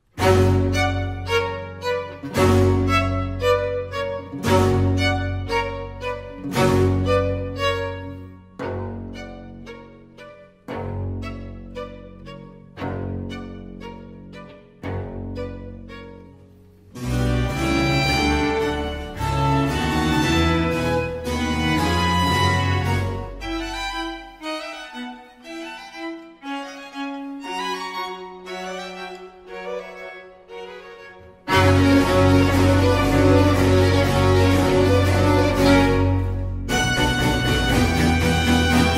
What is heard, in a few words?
Vivaldi Violin Concerto Op 11